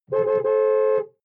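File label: sports car interior horn rhythms; verkehr-transport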